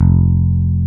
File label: Basses, Roland